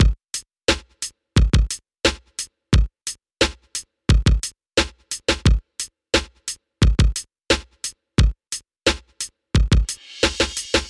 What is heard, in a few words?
Just Drums; maudio; Hella Bumps